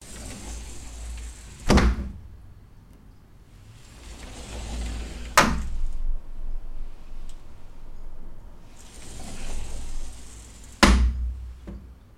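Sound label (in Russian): pereklyuchatel zvuka dvernogo vala zvuk razdvizhnoi dveri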